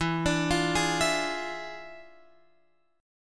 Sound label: E 7th Flat 9th Guitar Open F, chord; E7b9; arpeggiated